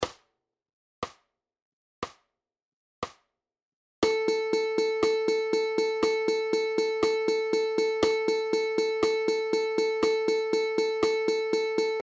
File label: 16th Notes at 60 BPM, ukulele; understanding rhythmic notation